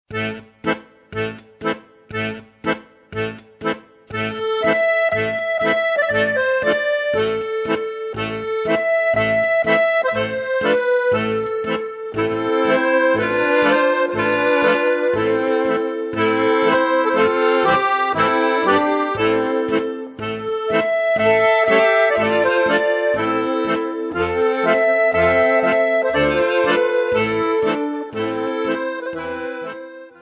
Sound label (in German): altrussisch, Accordion; Otdawali molodie